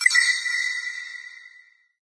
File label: amb random tech; source engine; portal2